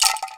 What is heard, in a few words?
RATTLE, INDIAN PERC